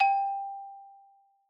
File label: Xylophone Soft G4 ff far, Soft Mallets; Idiophones; Struck Idiophones